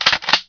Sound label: Henchman Loading Shotgun; Playable Tutorial Level; TR2